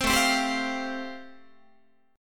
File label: A-Minor 7th Sharp 5th, Guitar, chord; Am7#5 strummed; JGuitar